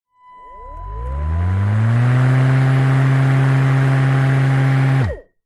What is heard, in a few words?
household mower electric on run static off close up